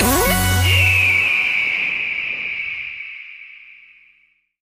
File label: fs Symbol Hawk; neon staxx